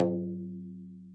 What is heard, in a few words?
okedo B3, Japanese Drums; ETHNIC and WORLD PERCUSSION